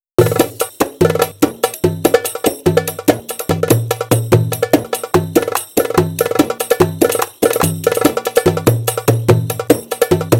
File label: darbuka show; by burak sound bank; darbuka ritim paketlerii vol 1